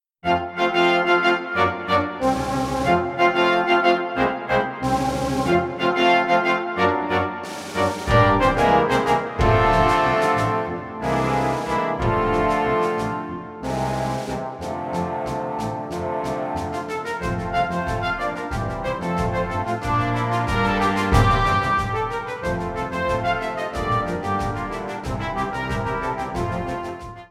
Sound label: Calypso Score